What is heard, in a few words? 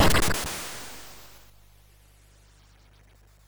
sid MOS6581R3; Bountyhunter-Destiny Now